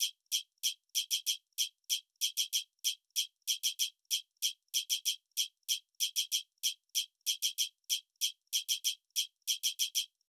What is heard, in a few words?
hat